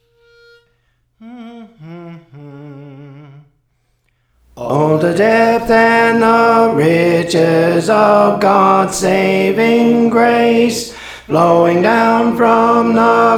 Tenor, Songs; Praise For The Lord Training

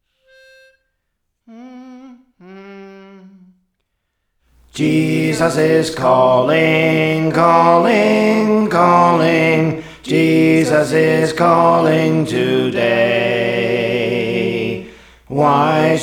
Alto; Praise For The Lord Training

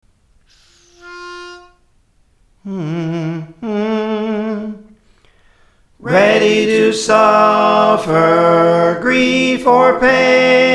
Soprano, Praise For The Lord Training

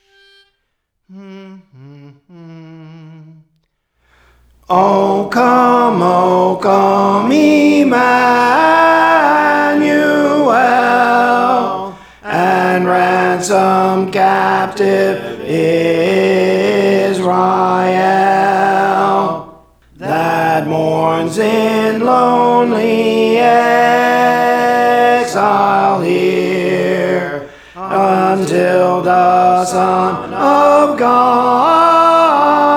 Tenor, Songs; Praise For The Lord Training

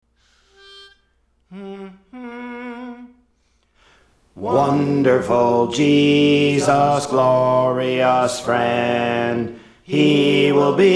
Alto; Praise For The Lord Training

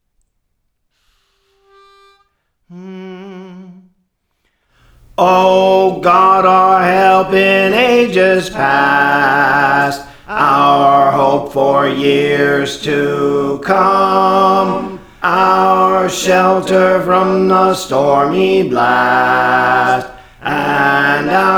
Chant Bass, Songs; Praise For The Lord Training; O God Our Help in Ages Past; Harveys Chant

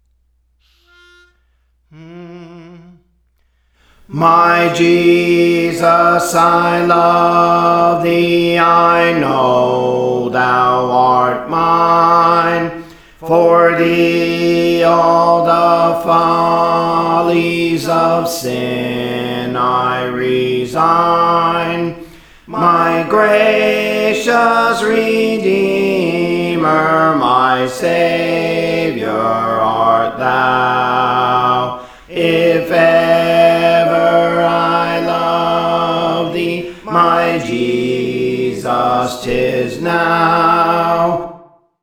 Bass, Songs; Praise For The Lord Training; My Jesus I Love Thee

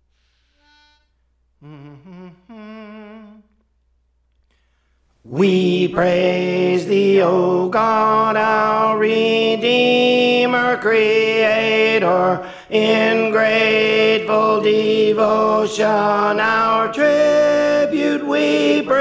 Tenor; Praise For The Lord Training

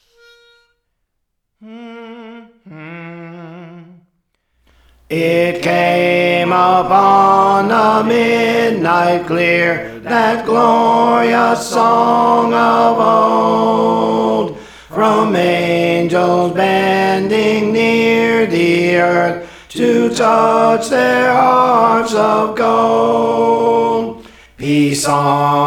Tenor; Praise For The Lord Training; It Came Upon a Midnight Clear

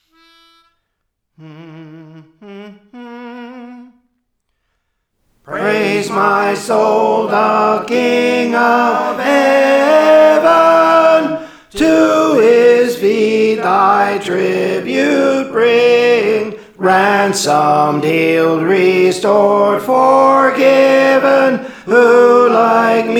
Tenor; Praise My Soul the King of Heaven; Praise For The Lord Training

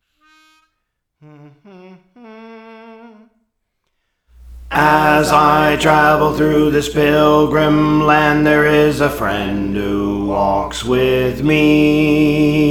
Bass; Praise For The Lord Training

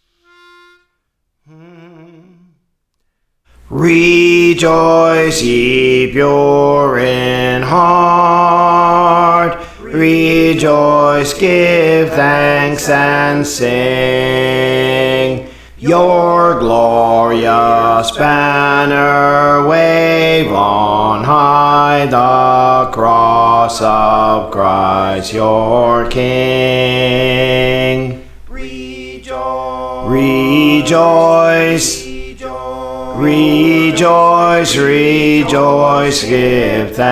Bass, Praise For The Lord Training

